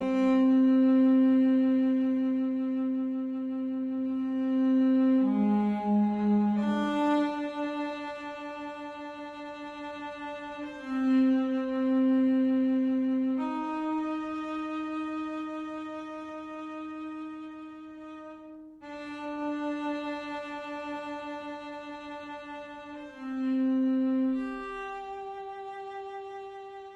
Loop Emotional

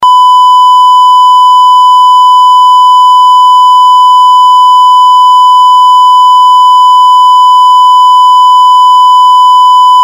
10sec Beep Loop; gamerip; Postal 2 Eternal Damnation

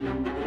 Music Dialogue Danger Start 64bpm

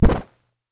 Landing

